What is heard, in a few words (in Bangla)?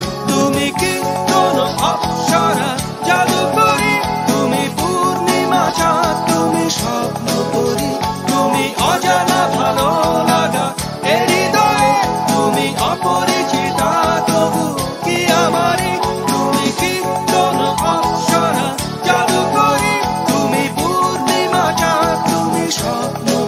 Bangla Song